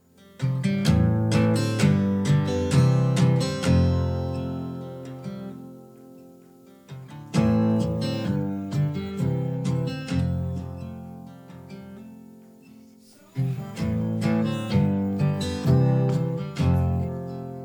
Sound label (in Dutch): Alleen Instrumentaal